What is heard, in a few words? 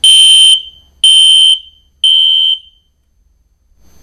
us code 3 electro mechanical, fire alarm; garrysmod